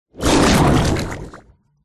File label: cartoon slime big growling slimy bubbling